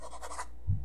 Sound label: write; cartographer